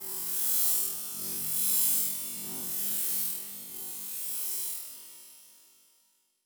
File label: Transition Stab Dark Electrical; Production Element